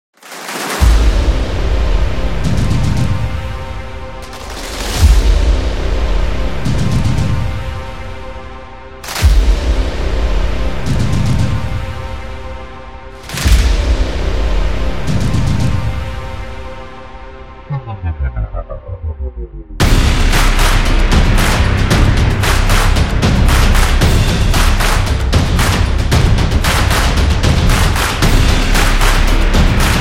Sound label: Trailer Sport